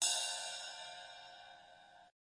Simple Ride, DRUMS, REAL LIVE KITS; Osama Qureshi DrumKit

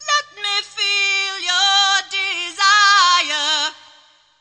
diva let me feel your desire, Cheesy Divas

